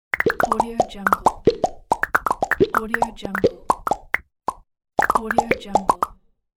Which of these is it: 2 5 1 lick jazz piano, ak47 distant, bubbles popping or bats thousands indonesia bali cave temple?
bubbles popping